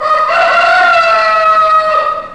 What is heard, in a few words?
ANIML; Zero G; Man Machine